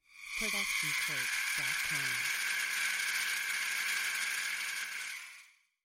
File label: Screamy Screetchy; soundscrate